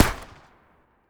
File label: MP40 Single Shot; Jagged Alliance 3